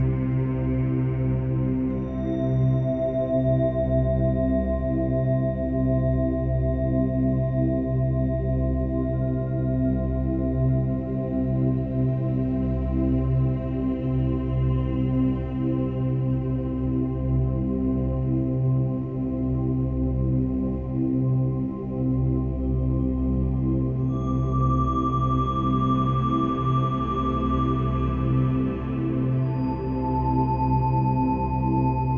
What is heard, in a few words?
forest; Mindboost